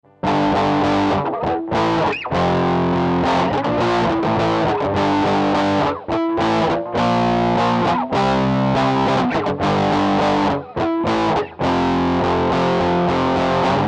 CHUNKY, Digitech RP200

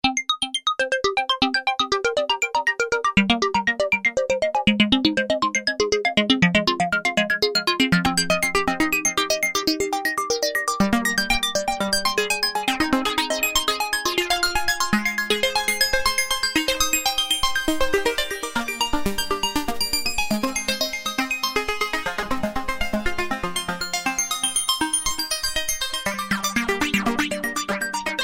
RETRO; Korg microKORG; Factory Demo Songs

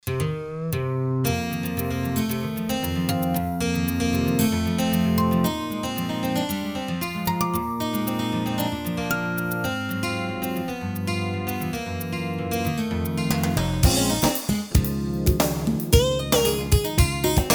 Guitars, Roland JV-880; Factory demo songs

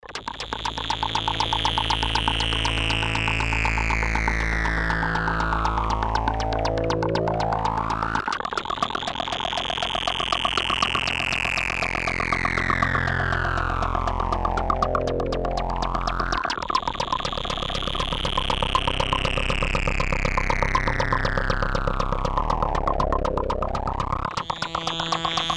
Dream of Jungle, Atmosphere; Green Oak Crystal

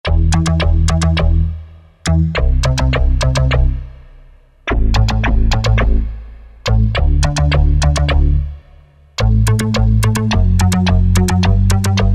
synth Moog; E-mu Proteus FX; Factory Presets

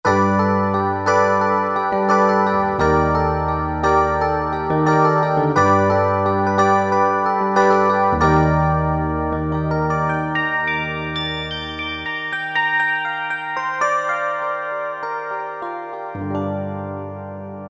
EP New DX, Yamaha TG33; Factory Presets

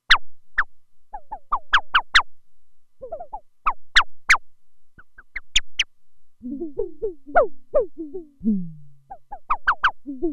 Sparrow, Roland Alpha Juno-2; Factory 2

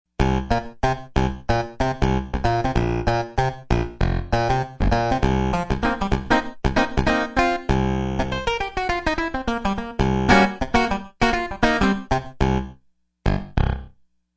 Funky Clav, Yamaha TG55; Factory Presets

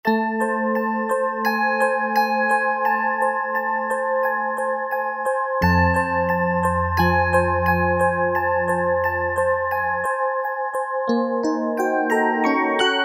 AMBIENT EP, Casio VZ-10M; Internal factory combi

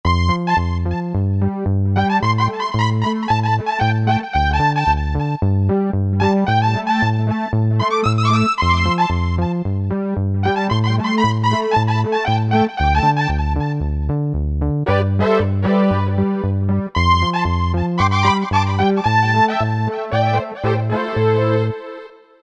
Bass-Lead, Roland SC-880; Factory Patches

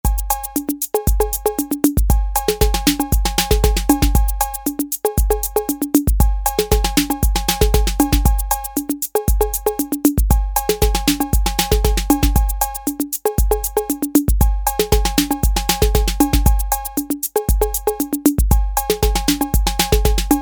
SAMBA, Roland TR-808; Factory

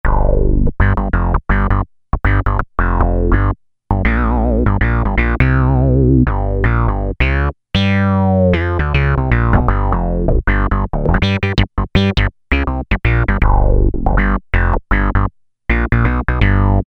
Phunk Bass, Korg MS2000; Factory Patches

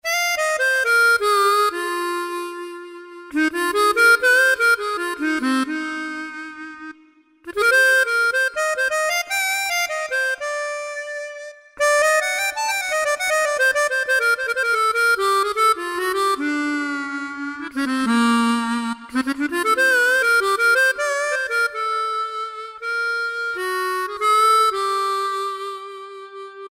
Harmonica, Yamaha PSR-3000; Factory Voices; Accordion & Harmonica

